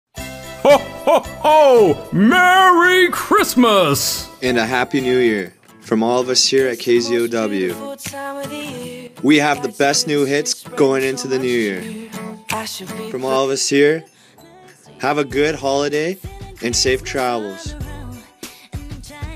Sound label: music mashup